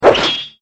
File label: SWORD